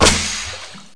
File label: reload flame Clip Out